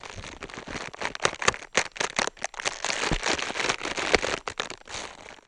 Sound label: Cabbage Rub Softly; gore stabs & eat